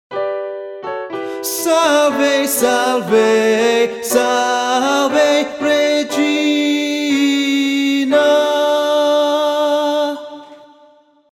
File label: Hail Holy voice 3 Salve salve part only, choir